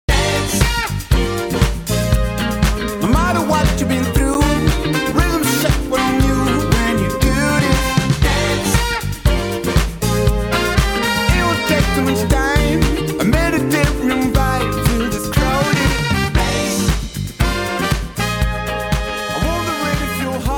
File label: Varible Mu Funk software; pulsar